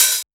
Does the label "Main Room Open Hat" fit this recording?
Yes